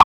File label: Glitch, One Shots, Glitch FX